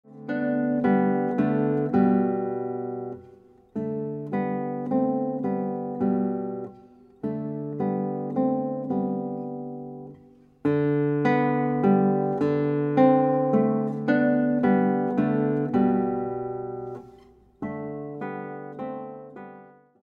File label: Guitar